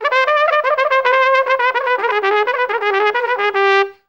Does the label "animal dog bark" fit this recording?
No